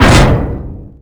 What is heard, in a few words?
ap hit